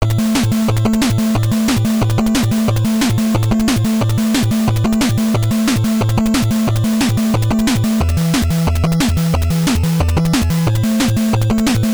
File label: Underwater Fortress; Secret Ties; NES